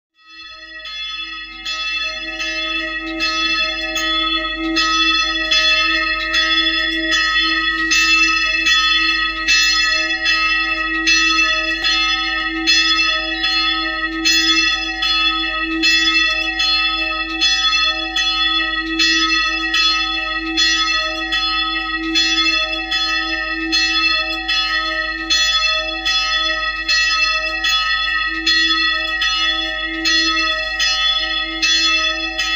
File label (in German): Glocken